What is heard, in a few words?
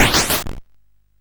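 Nebulus, MOS 8580 SID chip music, games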